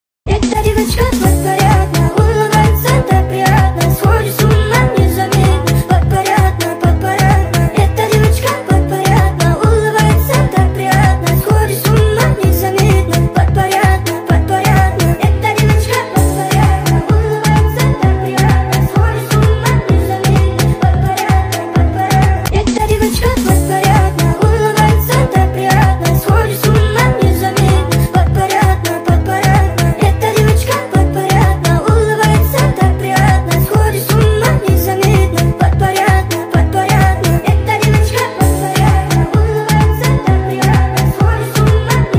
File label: speed up